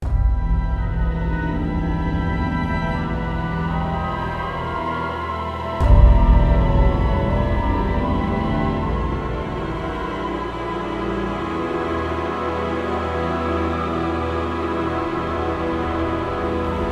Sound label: witch music